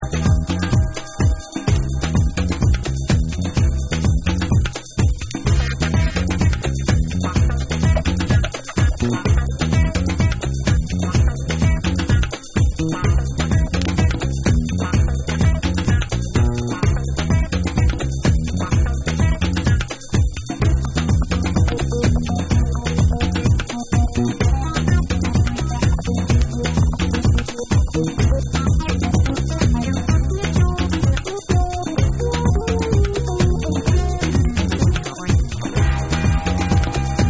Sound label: MOOG ROCK